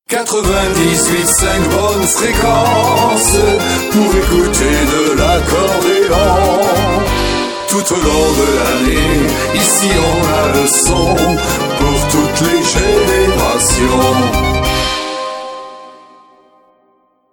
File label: jingle; transat fm